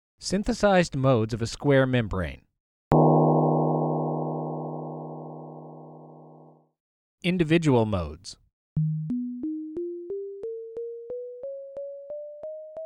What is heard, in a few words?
Square Membrane Modes